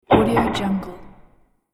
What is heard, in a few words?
hit dungeon impact